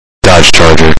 dodge charger loud

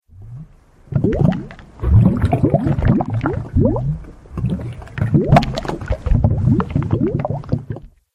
lava noises; minecraft

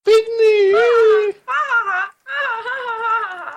aaahaha

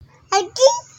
sneeze cute

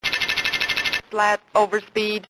slat overspeed

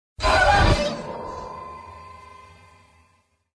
jumpscare sound; granny

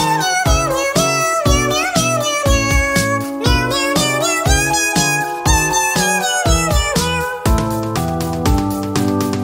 meow; rudolph

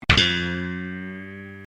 sitcom transition bass riff; seinfeld

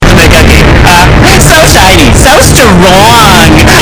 bass boosted

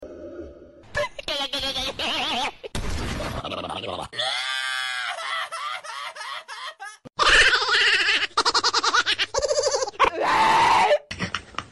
goofy laugh sounds

